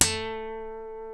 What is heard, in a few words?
B, funk guitars